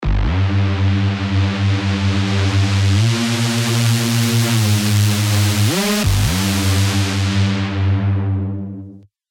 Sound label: Reese; Spire; Girl Trip; alonso 18 year anniversary pack